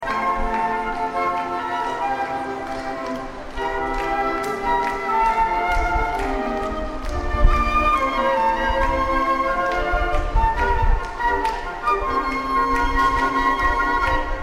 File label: flute; となりのトトロ メドレー; arietta-flute